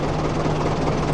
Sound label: engine wait